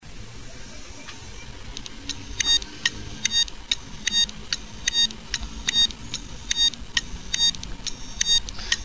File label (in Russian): Stuk tolstyh modeley (DiamondMax Plus9) vyzvannyy neispravnostyu bloka magnitnyh golovok